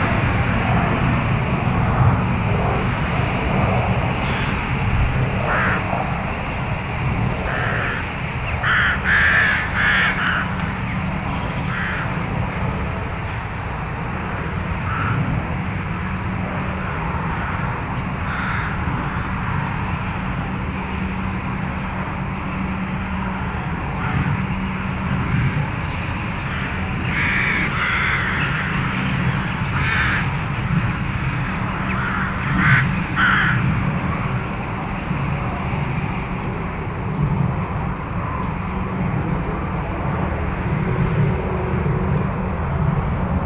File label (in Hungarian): varjak kergetté seregélyek erős motorizációs zaj; Hortobágyi Nemzeti Park